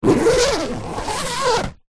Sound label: foley suitcase zipper